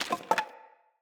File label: Wood Pickup